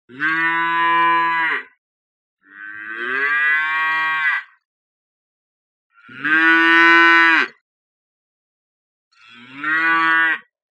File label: Cow Baby Calf Sounds Car Horn